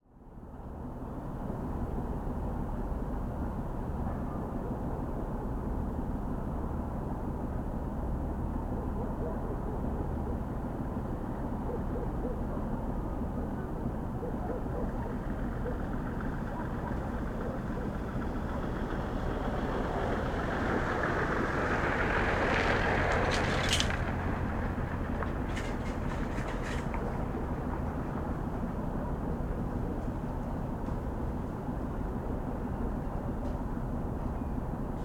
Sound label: Kamchatka, Distant Cargo Port. Wind, Prop Planes Overhead, Mechanisms, Distant Dogs; Transportation Truck Pass By; Alexey Sinitsyn; Kamchatka Peninsula Ambiences